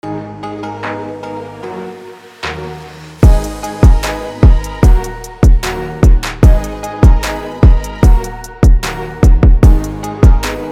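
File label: URBAN G# minor 150BPM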